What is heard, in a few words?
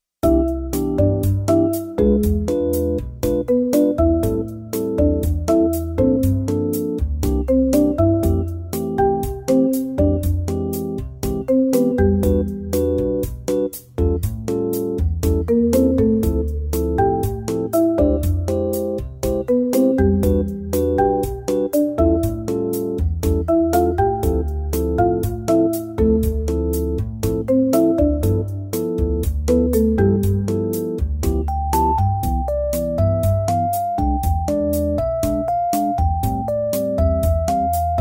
Bossanova